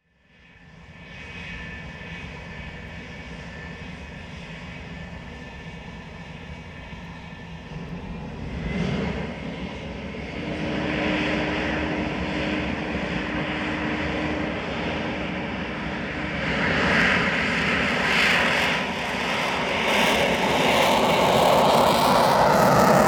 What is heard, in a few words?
jet starting